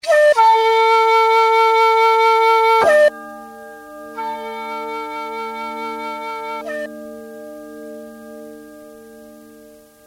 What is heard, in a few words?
panpipes